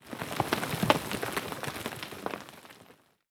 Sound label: impacts; World of Tanks